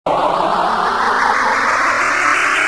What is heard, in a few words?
crystal pods, movers objects